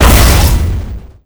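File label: boss explosion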